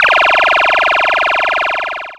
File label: Retro Laser Shots